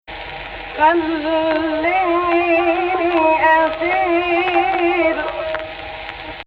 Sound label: AHH, Egypt Rast